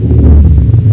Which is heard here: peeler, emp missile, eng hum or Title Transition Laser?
eng hum